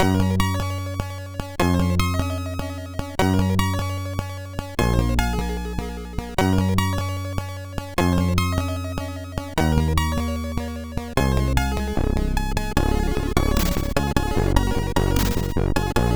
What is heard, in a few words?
sid MOS6581R4